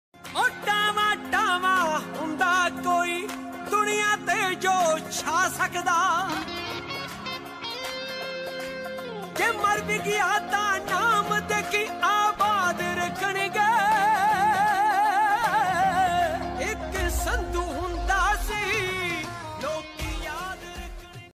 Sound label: DJ Punjabi Ringtone